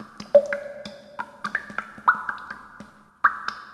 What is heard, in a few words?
drops